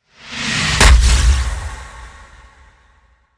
Kick Hit Swell; Ninja Tracks

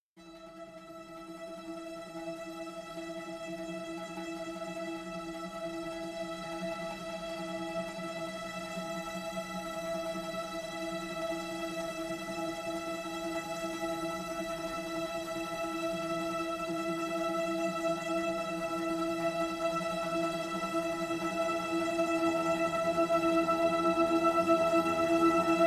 Suspended Trems (E); Brand X Music; Brand X Music Catalogue - Editor's Essentials

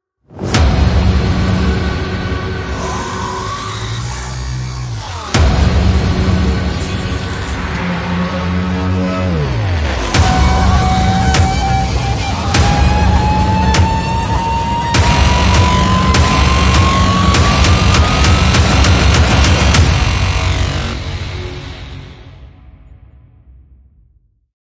Forewarning No Drum; Ninja Tracks

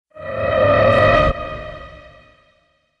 Planetary Swell Tonal; Ninja Tracks

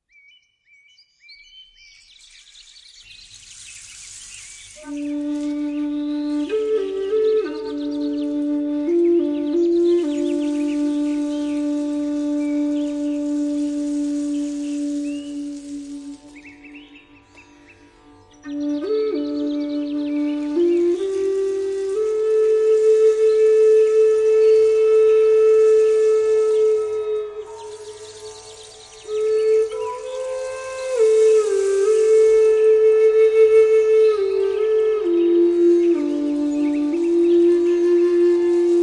Forest Walk

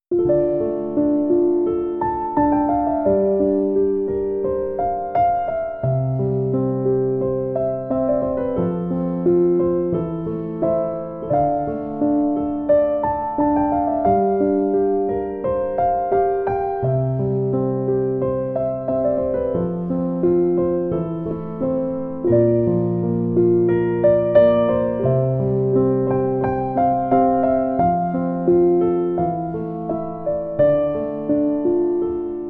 Sad Piano Songs

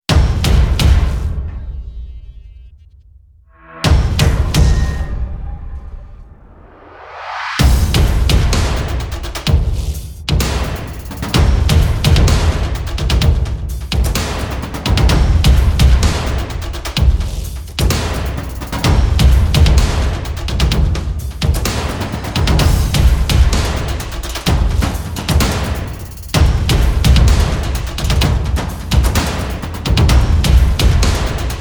Drums

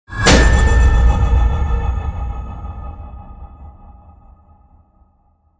Hit Metal; Ninja Tracks

